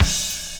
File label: Kick Crash; Wiggle Disc